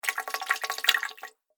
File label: Water Fast Little Bubbles; Splish, Splash, Splosh!; Andrew Fleming